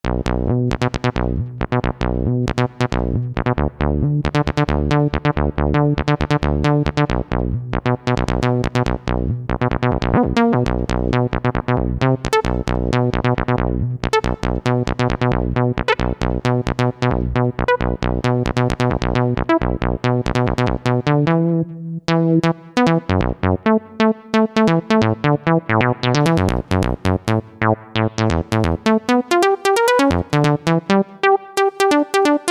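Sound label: Acid TB bass; Roland SRX-07 Ultimate Keys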